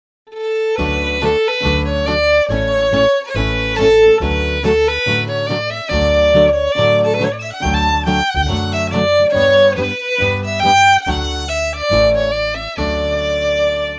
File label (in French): joyeux anniversaire jazz; lesseur olivier